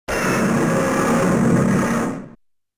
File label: Boss Out